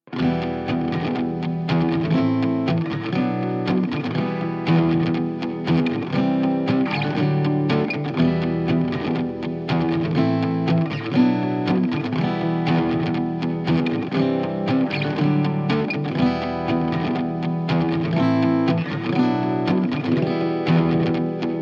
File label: Em 120 GTR rock motif